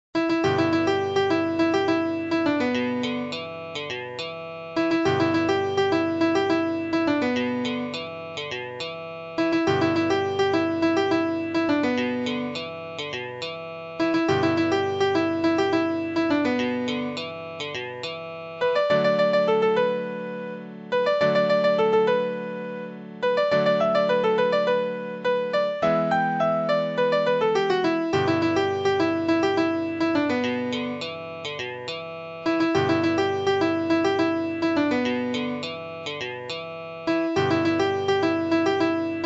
Piano

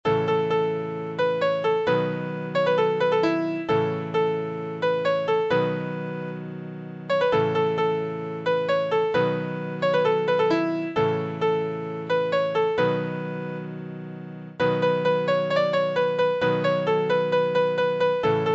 Piano

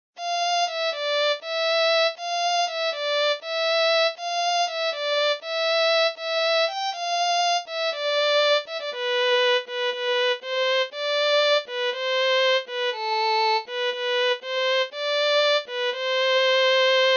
Violin

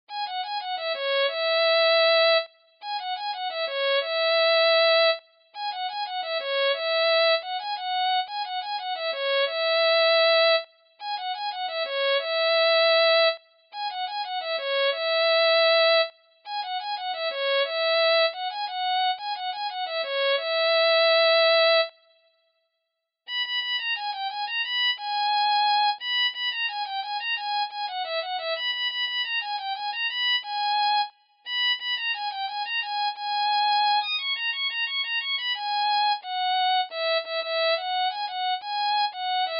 Violin

